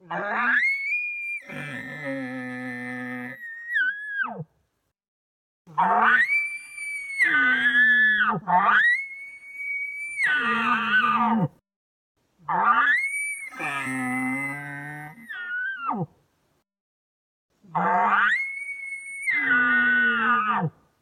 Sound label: Calls Challenge Bugles, elk